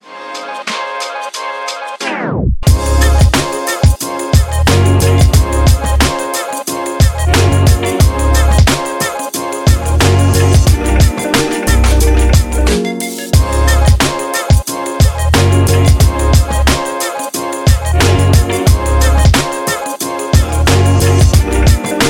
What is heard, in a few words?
energetic hip hop uplift